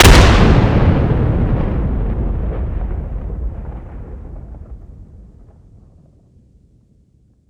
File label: cache explode distant; emplacement